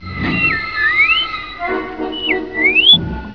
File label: beep whist; star wars